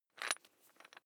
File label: electric drill bit fasten wrench turn very fast